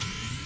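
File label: minigun power up; Allegiance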